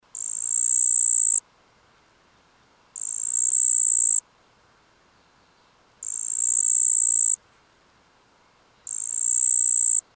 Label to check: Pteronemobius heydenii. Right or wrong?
Right